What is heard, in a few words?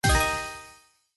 Symbol X Win; slots; Ninpo Slash